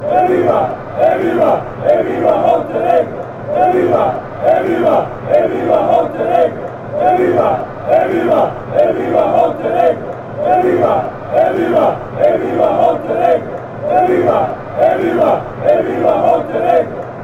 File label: montenegro chant